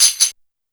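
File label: tambourine; TAMBOURIN KT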